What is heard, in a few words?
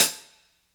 drumbreak hihat dry; Hiphop